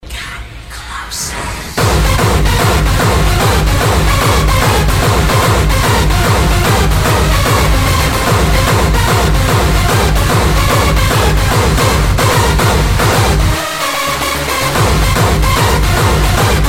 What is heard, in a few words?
Hardstyle